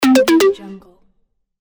new sms

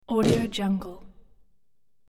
dull gavel impact; hollywoodedge

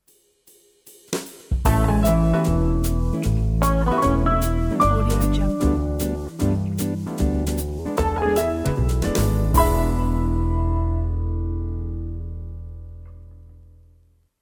jazz logo; warsaw; fxprosound

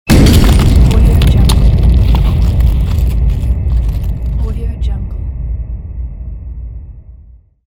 bomb

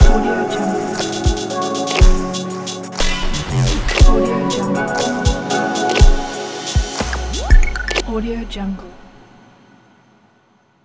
modern logo

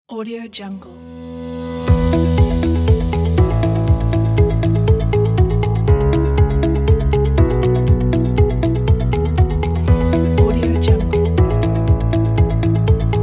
motivational corporate

